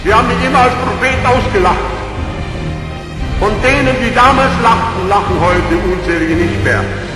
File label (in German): lachen; torchlight